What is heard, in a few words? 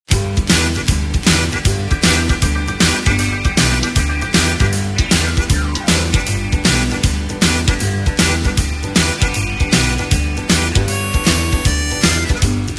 Rockabilly Blues (G)